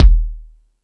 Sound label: Lotsa Kicks, Drum Machines